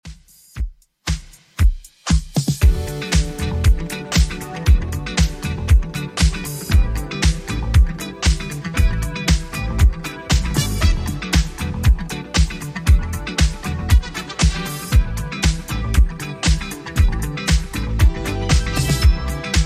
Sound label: 80s Redrum 117bpm; remixplanet